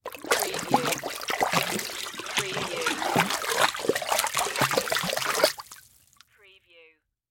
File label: Water Walk Wade Heavy; Sound Effects Bible